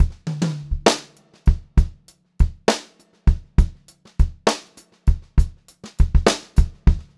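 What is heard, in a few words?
variation, pop-rock drumloops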